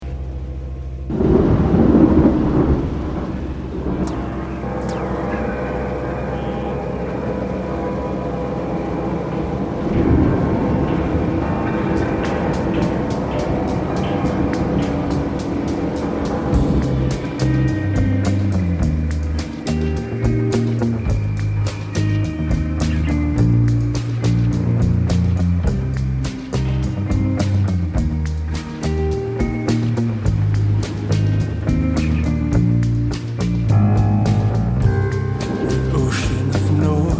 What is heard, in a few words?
ocean of noise